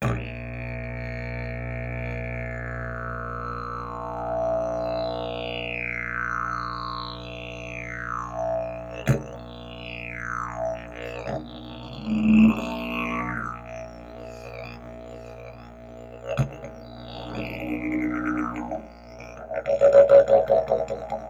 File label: didge